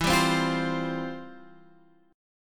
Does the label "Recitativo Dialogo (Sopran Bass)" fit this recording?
No